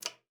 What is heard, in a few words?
KLPB A4, ALTOSAX; Xsample VOL-2